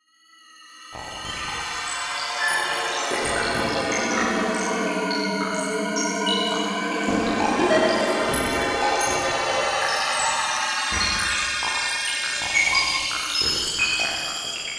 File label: Sci-Fi ringtones; clunky, analog sounds of vintage sci-fi films; beepzoid